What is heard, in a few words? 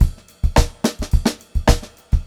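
106 groovy variation 2, funk groove drumloops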